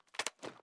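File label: sniper reload empty arm; gmod; iw8; xmike109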